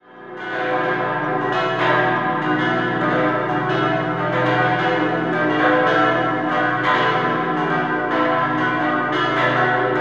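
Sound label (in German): geläute vor und nach komplettumbau mit holzarmaturen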